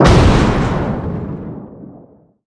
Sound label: damage hits explosions, Warships